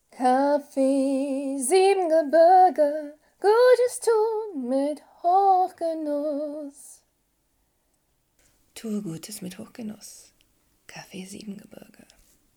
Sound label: Jingle